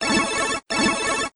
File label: win, slots; fivem